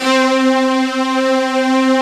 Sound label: BIG BUZ C3, SYNTHS; Kid Nepro; Elite